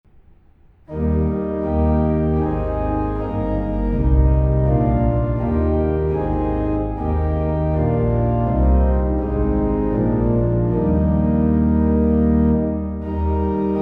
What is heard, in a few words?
organ; hymns